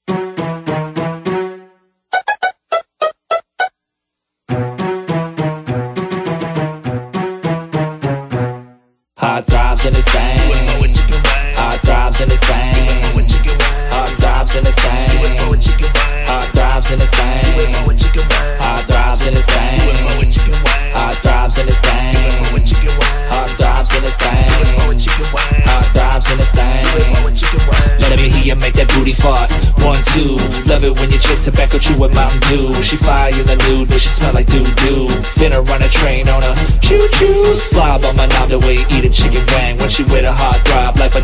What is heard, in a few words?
Whistle Remix